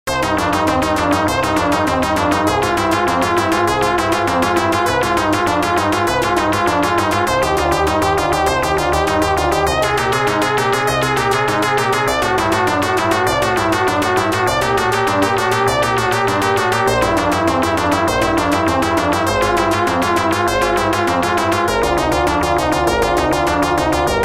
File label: Prelude C minor